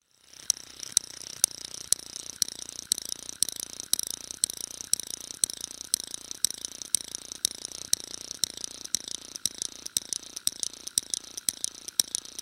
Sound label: Toy Motor Run, Toy Motor Run Clicks; Toy Cars; Foley; Toys; Blastwave